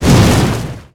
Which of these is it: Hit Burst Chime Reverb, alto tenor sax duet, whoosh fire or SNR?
whoosh fire